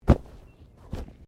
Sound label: Body movement Fall Medium